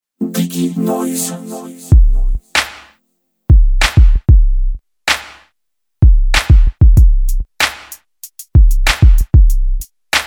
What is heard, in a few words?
digidrums; diginoiz